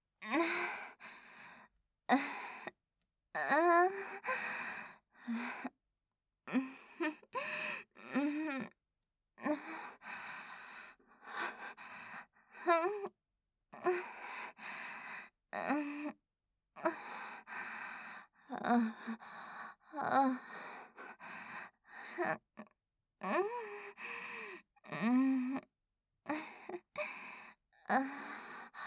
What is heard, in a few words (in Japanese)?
お姉さんの えっちな 喘ぎ声